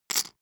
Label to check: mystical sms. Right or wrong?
Wrong